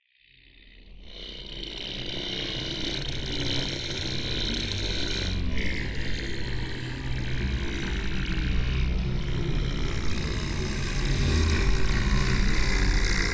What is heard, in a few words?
black soul growl energy entity drone; quad LsRs